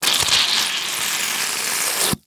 Industry Tool Plunger Wet Plunger Suction On Cement